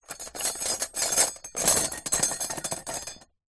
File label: household cutlery metal movement in plastic tub